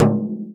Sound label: FRAME, INDIAN PERC; Big Fish Audio - Didgeridoo and Other Primitive Instruments